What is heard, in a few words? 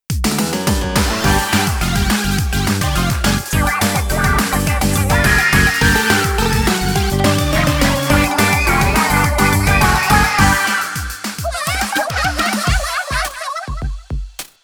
Battle Victory; Splatoween